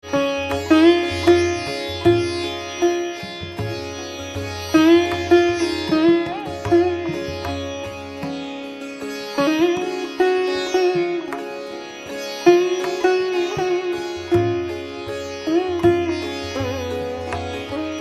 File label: Vilambit Gat